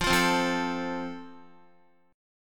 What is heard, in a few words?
F Major, Guitar; strummed